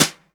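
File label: snare brush, drum machines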